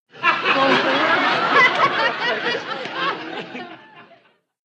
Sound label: Crowd Light Laughter, medium